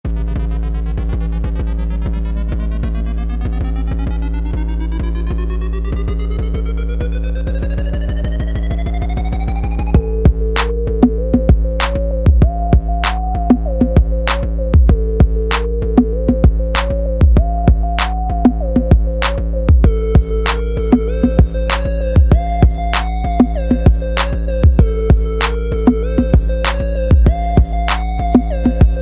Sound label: urban beats